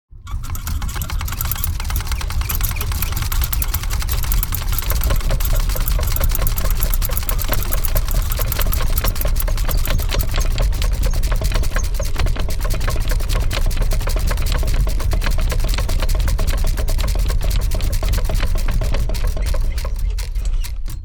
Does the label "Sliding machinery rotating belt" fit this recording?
Yes